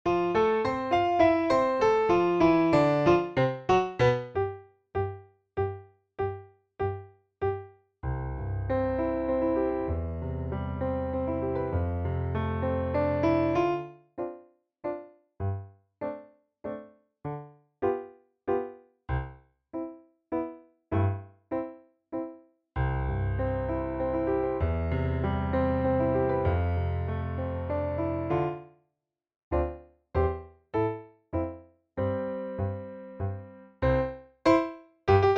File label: Piano Accomp Waltz 92bpm; Musettas Waltz from La Boheme